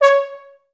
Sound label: TB STAC C#4; Best Service; Peter Siedlaczek's Advanced Orchestra